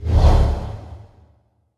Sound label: Spirit Mausoleum Attack; Fallen Ones